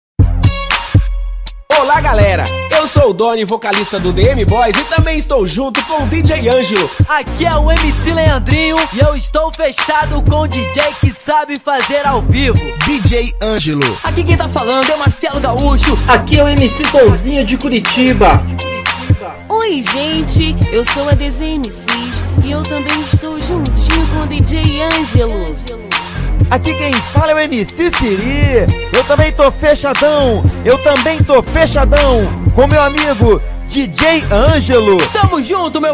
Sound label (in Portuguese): mega mix de vinhetas